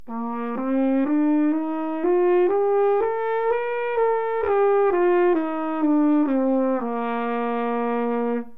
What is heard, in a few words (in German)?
tonleiter alte trompete